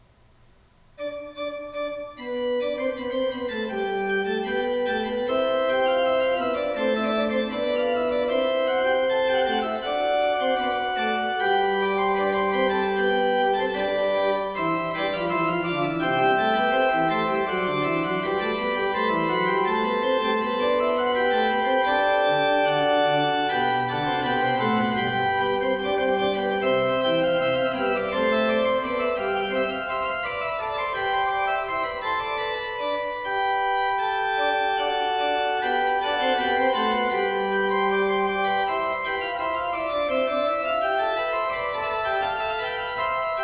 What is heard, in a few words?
Fugue, Organ; Magnificat octavi toni; Johann Pachelbel; Complete Organ Works